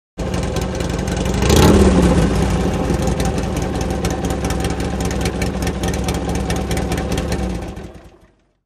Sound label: Motorcycle Road Racing